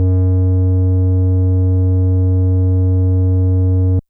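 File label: FM Sq Dist DX100 F#0; square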